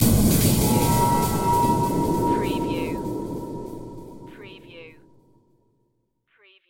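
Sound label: Production Element Hits Dark; Sound Effects Bible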